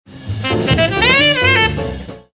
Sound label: sax